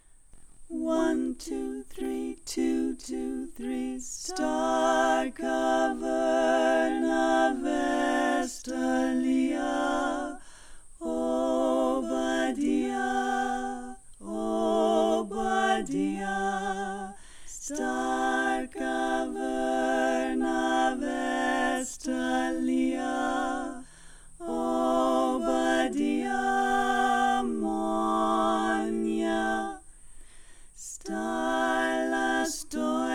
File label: Full Song